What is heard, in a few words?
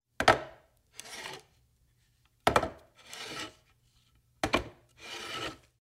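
skeleton walks and drags leg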